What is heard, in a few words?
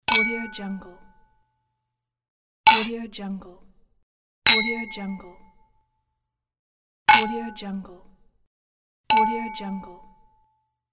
wine glasses